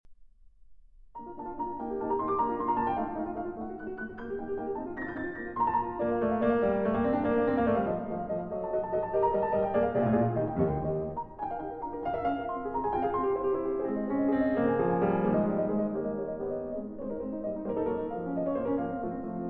Piano Sonata No. 21 in B-Flat Major Scherzo Allegro vivace con delicate; D. 960 III.; con delicatezza